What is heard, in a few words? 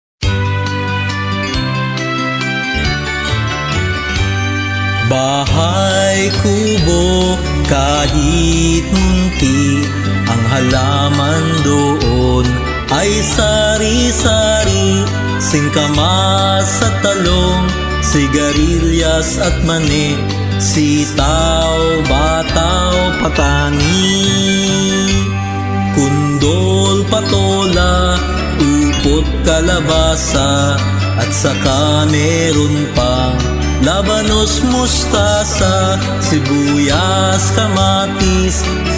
bahay kubo tagalog traditional